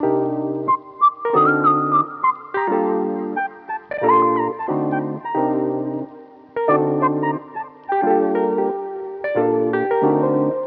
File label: Lofi Melody Loop - 90 BPM G Min; Melodics; Cymatics - Eternity Sample Pack